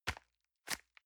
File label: envelope grab release medium